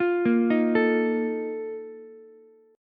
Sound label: A sharp Major 7th Suspended 4th, Ukulele; arpeggiated; JGuitar